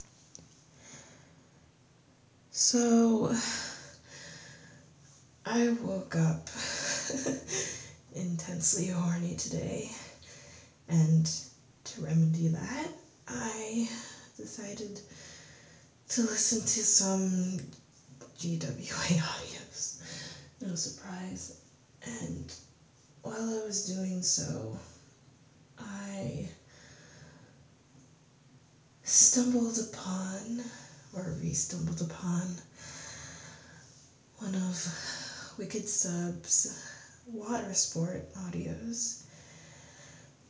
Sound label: [F4A] [LOUD][girlie noises][hitachi]; Edging and Holding It; watersports